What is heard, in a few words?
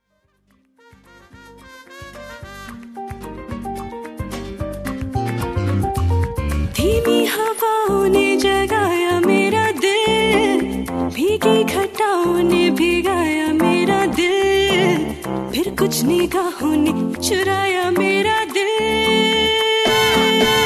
Acoustic